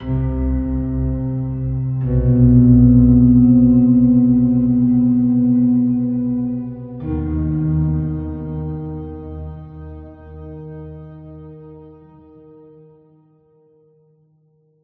Dark piano Sample

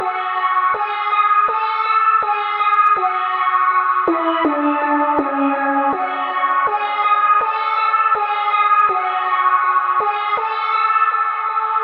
lead

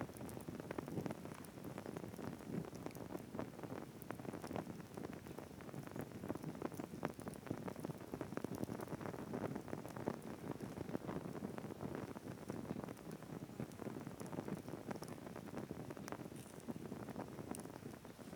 lamp loop

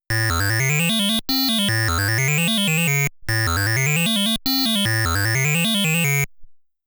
Challenging Stage Start Music; New Rally X

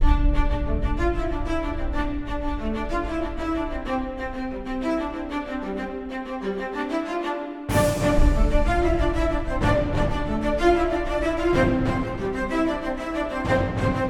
LOOP main mission; Panda

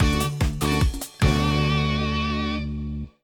music park ending

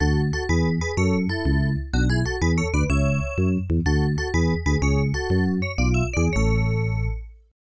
Unused BGM

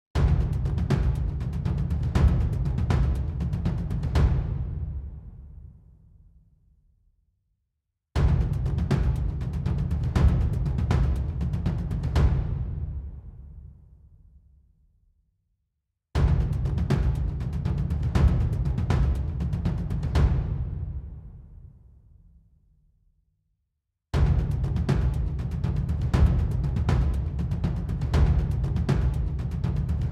Stabs; raji an ancient epic

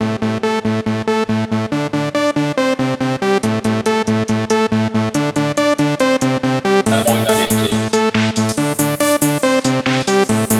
laserdance; Avoid Sensory Overload

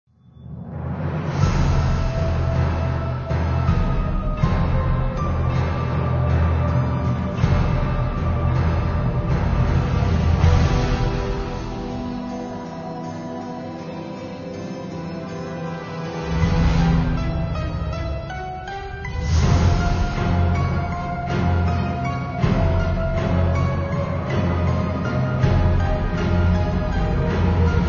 Mission Start; Creeper World 2

